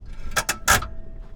Polaris Ranger, Parking Brake, Onboard, Left, Electric UTV; Polaris Ranger Electric UTV 2017